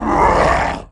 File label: Die